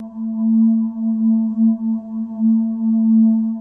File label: Shuttle Engine High, starship